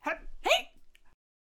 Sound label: Dragon Carrier Attack Vocals